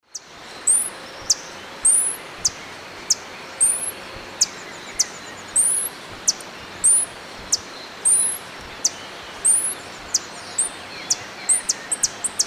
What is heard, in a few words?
Tangara chilensis, dawn song; S. America; Eastern Lowlands